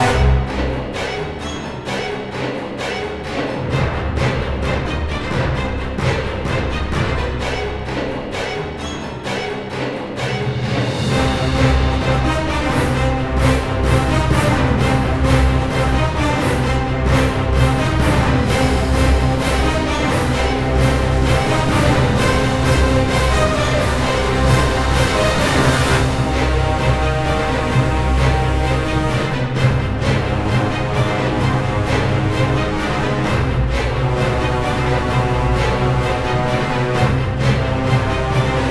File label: Storm Loop; Jotun